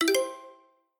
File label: effect retrigger count update